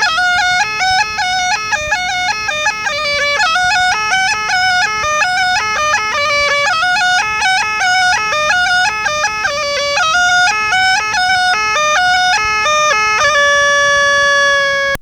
Varnenski 9 melody Hijaz, Small Gajda

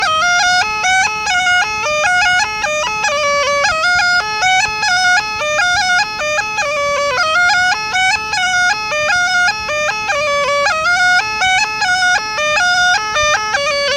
Varnenski 9 melody minor, Small Gajda

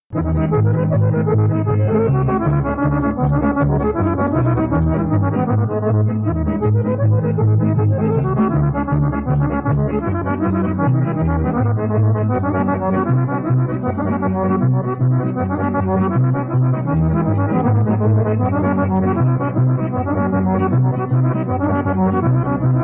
Bulgaria Bregovsko Horo, Folk Dances; Petaluma; 2nd String Folk Dances

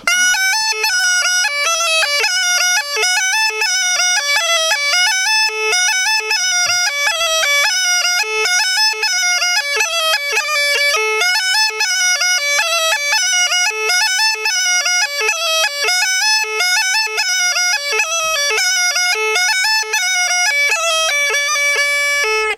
Chetvorno Line, Small Gajda

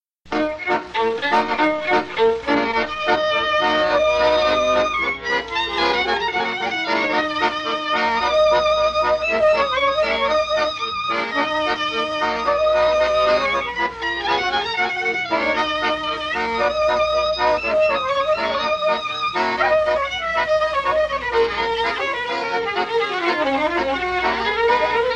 Romania Hora de la Putna, Folk Dances; Petaluma; 1st String Folk Dances; Romanian

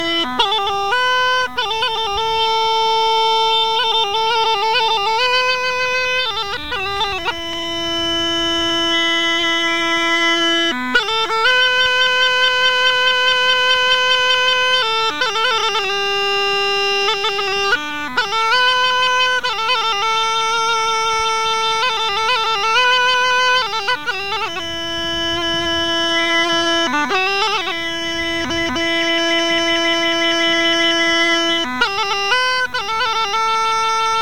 Slow Song, Rodope Gajada

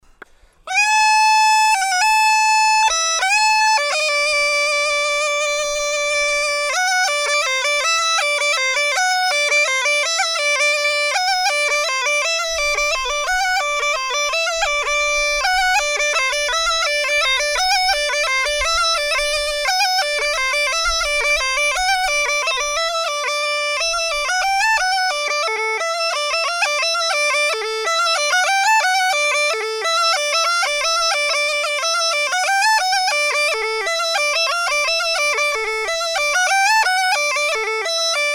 horo, Gajda; Lesson Recordings; Petar Usmivkata Aleksiev

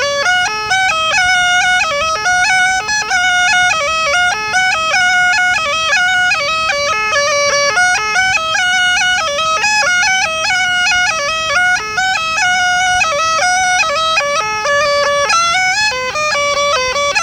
Varnenski 9 melody major, Small Gajda

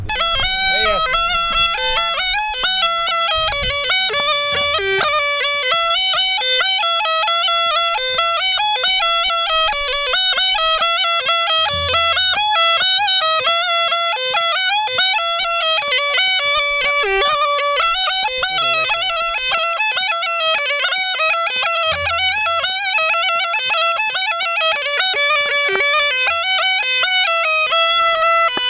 Small Gajda, Slowly

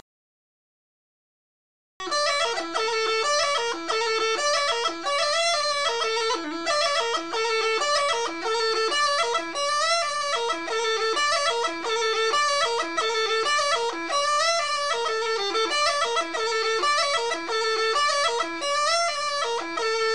Ruchenitsa Line, Gajda; Gajda Lesson

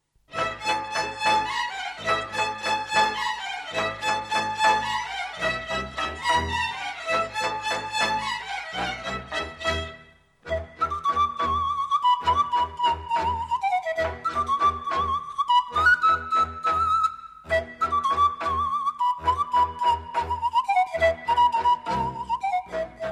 Romania Mandrele, Folk Dances; Petaluma; 2nd String Folk Dances; Romanian

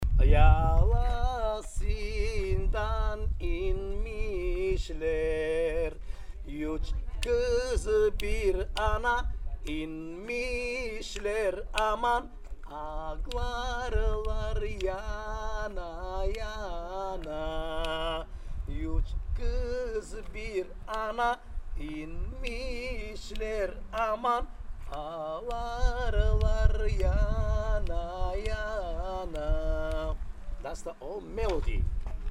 singing class

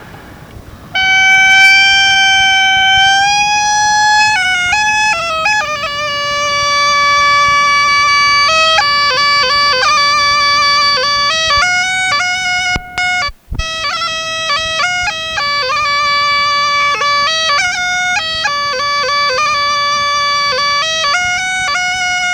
Small Gajda; Pravo; Lesson Recordings; Vasil - Small Gajda Lessons

